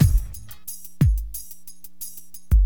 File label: DM LOOP, HipHop, Drums; LiveAct Pool